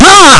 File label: raargh; world games